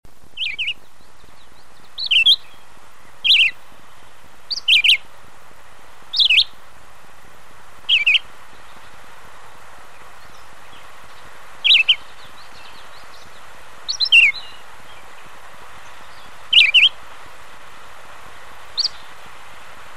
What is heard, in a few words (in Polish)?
wireonek czerwonooki - dłuższy występ; wroblowe